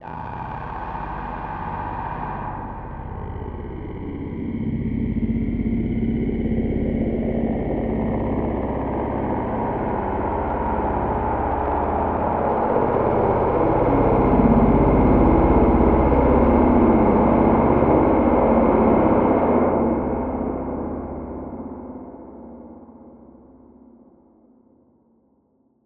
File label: Robowraith; Tyrann0s; Distorted Reality